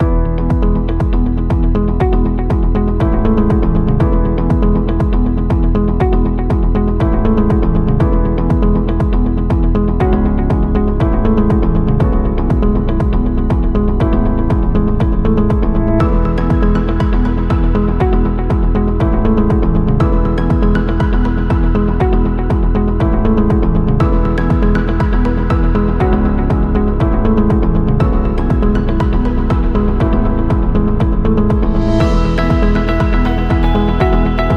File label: Corporate Loop Upbeat Background